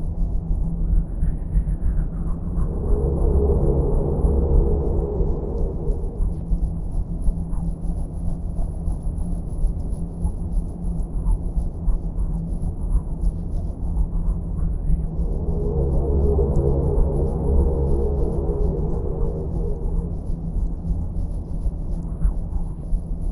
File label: mega shipyard idle; Stellaris; federations